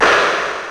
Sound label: Fairlight CMI III Noise Slap